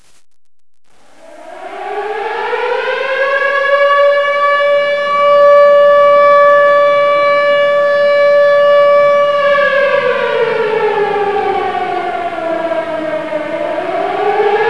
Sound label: AIR RAID; Windows